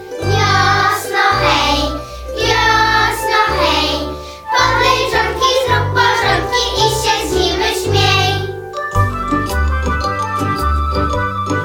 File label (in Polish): wersja wokalna